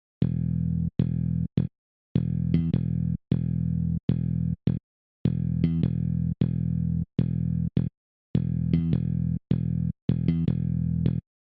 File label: no bass